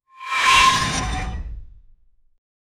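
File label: heavy axe throw no whoosh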